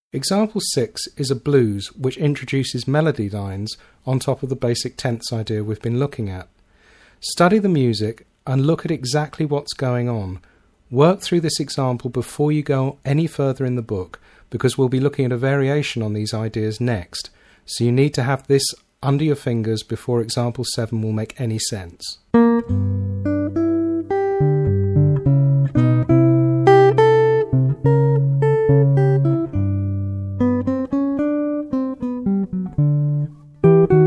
Basic Blues With Melody And Simple Bassline, Guitar